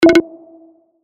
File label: rejected